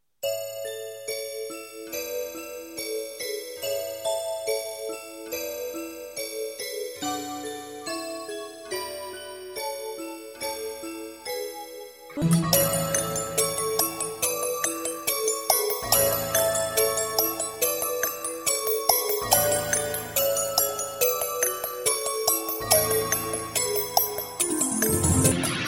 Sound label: Xmas New Tone, suoneria